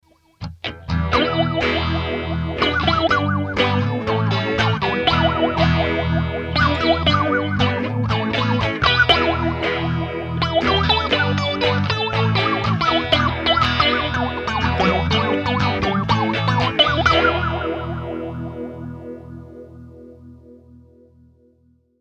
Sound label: Phasor; DOD-595; circuit demos; factory